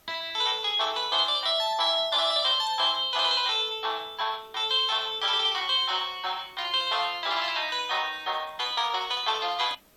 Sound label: samsung sghe700 ringtone